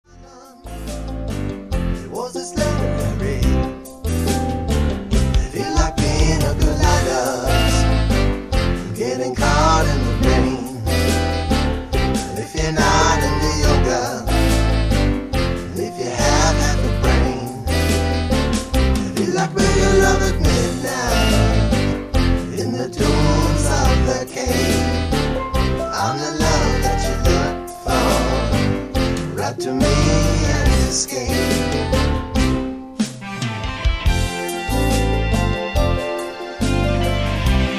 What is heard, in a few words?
Steel Drummer; This Los Angeles Steel Drummer radiates a laid-back beach party vibe